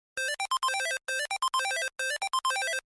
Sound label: Rush, alarm sounds